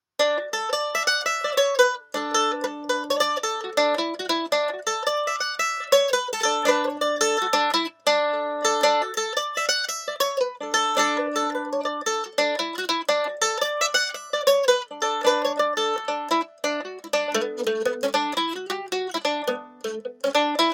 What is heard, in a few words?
Mandolin Pick Comparison